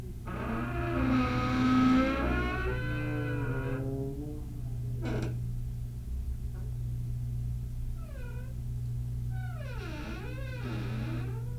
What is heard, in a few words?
Door Creaking